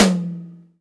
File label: STD. TOM, DRY KIT; AKAI S-Series CD-ROM Sound Library VOL-1; DRYKIT1